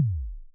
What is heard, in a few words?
kick